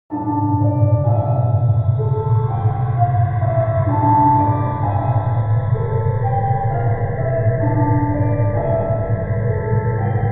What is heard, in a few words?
Small Anxiety